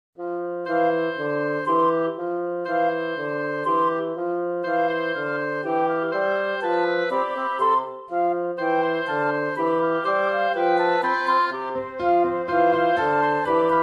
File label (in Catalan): De pressa Instrumental; cançons de la cantata